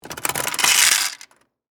Vending Machine, Industry, Vending, Machine, Candy, Turn, Handle, Dispense; Machinery